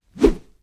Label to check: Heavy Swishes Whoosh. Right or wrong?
Right